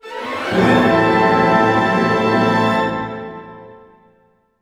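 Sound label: glissando A minor, Orchestra; Sound On Sound - Peter Siedleczek's Orchestra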